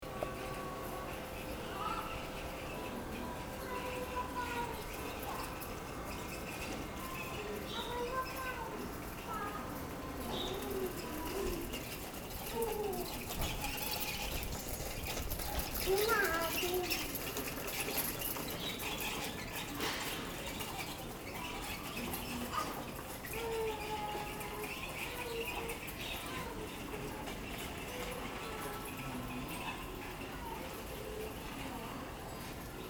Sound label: lisbon ambience